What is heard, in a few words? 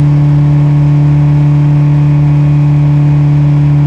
Engine Constants, Transportation; E-MU Producer Series Vol. 3 – Hollywood Sound Effects